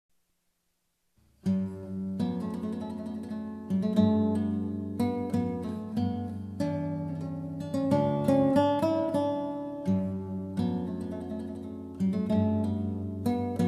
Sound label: minuet, 11 Strings; SL Weiss; Partita in G